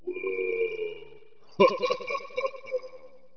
bump; quake2; snork

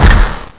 bump; quake2; dung

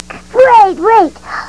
pain; quake2; chuckief

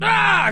burn; quake2; strongbad

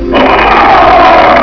pain; quake2; grimlock

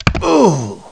fall; quake2; hunter

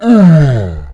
pain; quake2; morticus

